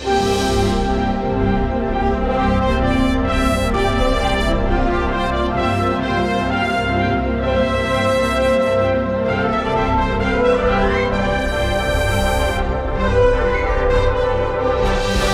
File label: Sputnik Launch; Buzz Aldrin's Space Program Manager